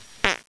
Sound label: fart